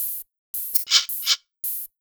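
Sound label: 110 hi hat loop, Ragga & Dub Reggae; USB Soundscan vol.27; AKAI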